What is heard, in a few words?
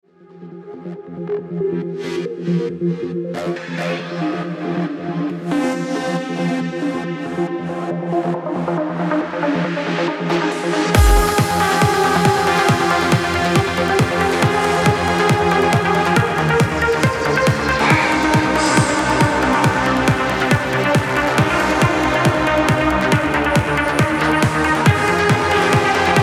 Uplifting